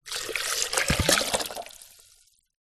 food drink metal thermos flask fill with water fast